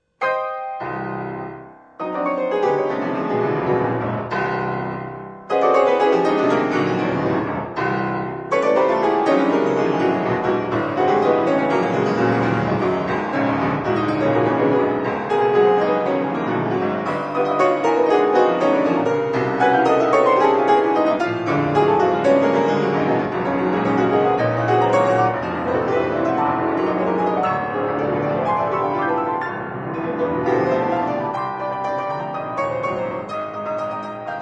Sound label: piano sonata toccata